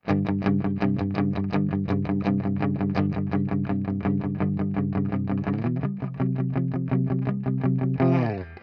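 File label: Jaguar mute crunch; fender classic player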